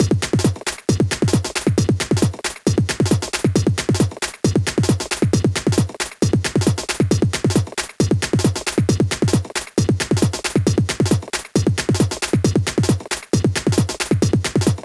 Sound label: Fall Beat